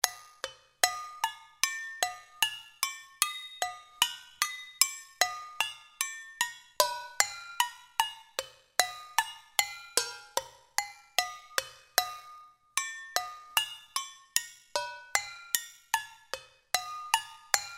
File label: Tin Cans